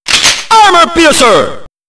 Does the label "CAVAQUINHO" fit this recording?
No